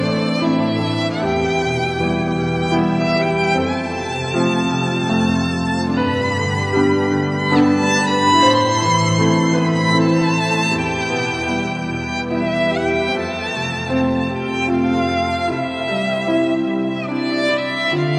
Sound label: sad violin